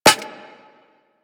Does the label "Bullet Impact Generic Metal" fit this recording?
Yes